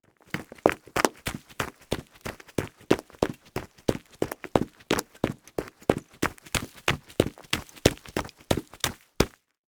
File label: Foley Footstep Cowboy Boots Run On Leaves And Twigs; Foley Footsteps